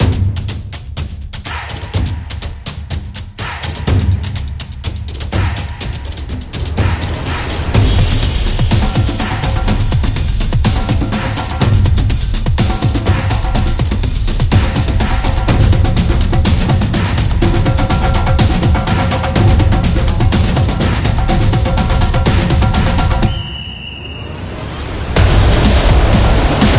Football Drums; samba

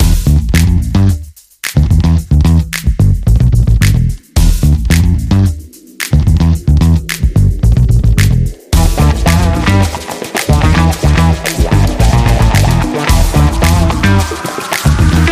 Stylish Groove Beat

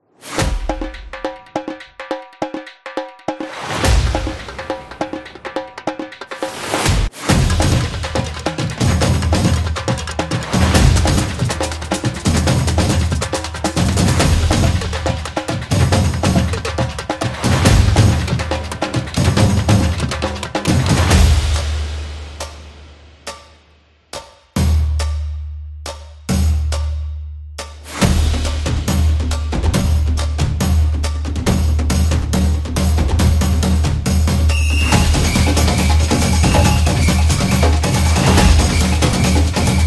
Brazil Drums

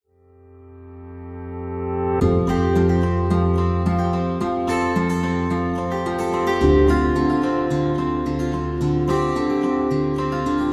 Acoustic Guitar Melow

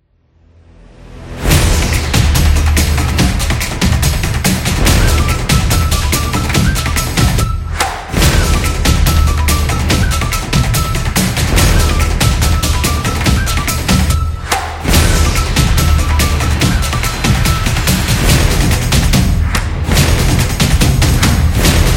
Energetic Dynamic Intro

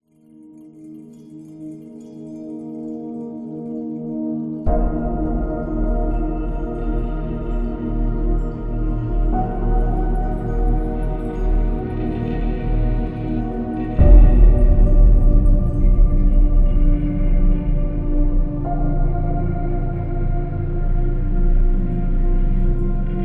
Dark Cinematic

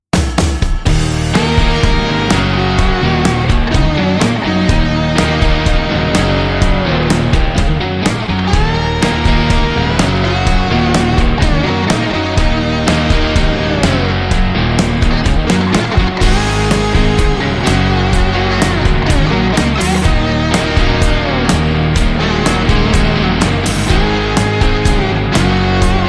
Starter Logo Guitar Blues; blues intros

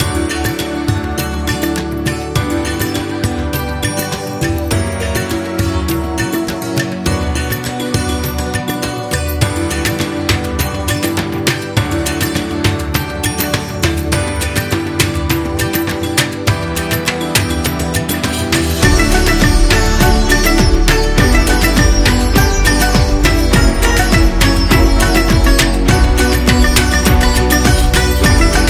India Positive

